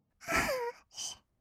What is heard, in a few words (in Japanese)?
"あはっ", kawaii voice; 1200 kawaii voice!!